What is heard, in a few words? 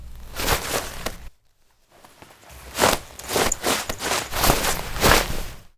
Foley; Cinematics; Drifter Lotus End